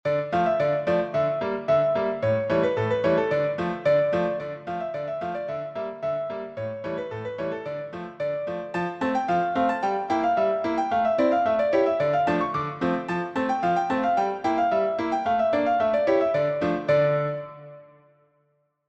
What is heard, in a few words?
Polka, piano